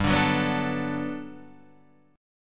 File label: G Major 9th, Guitar, Standard; GM9 strummed; JGuitar